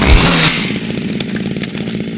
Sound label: chainsaw slash, weapons